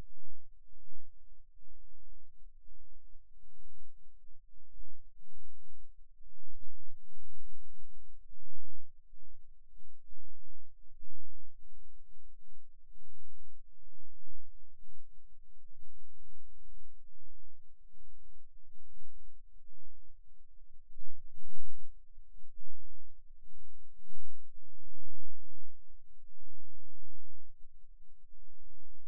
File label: Rhythm Wave, eeg seizures